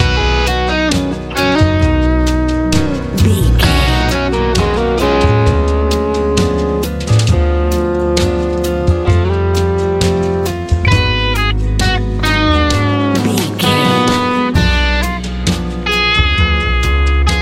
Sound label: sunny big nice airplane ride life easy pop